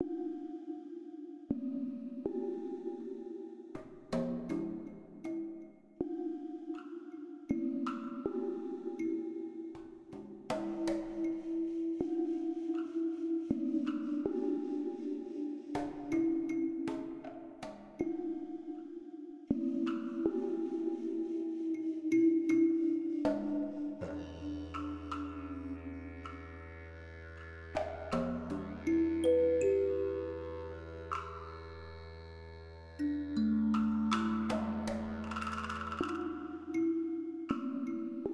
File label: Music Australia Ambient